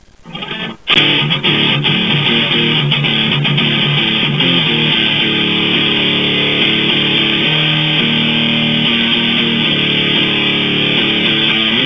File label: guitar